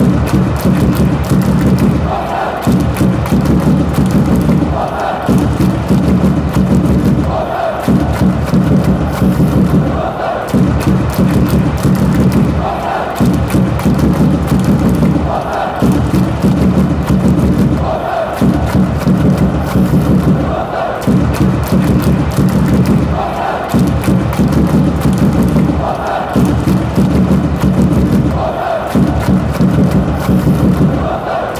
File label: hoffen with rhythm